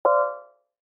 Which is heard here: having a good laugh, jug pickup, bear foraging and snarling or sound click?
sound click